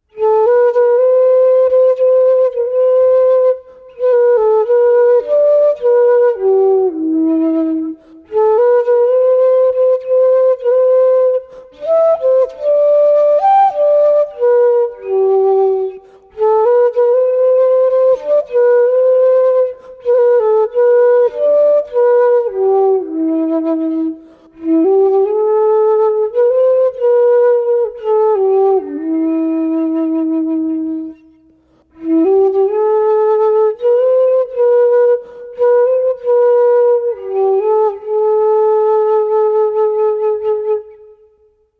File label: bamboo